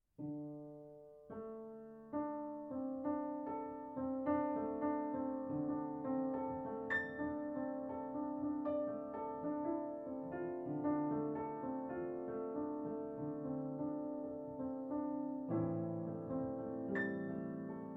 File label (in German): Playback kurz